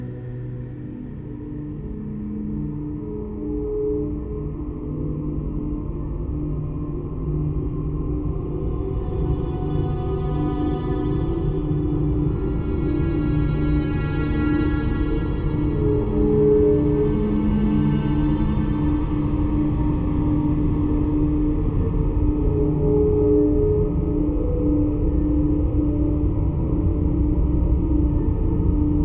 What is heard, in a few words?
Cave; The Swapper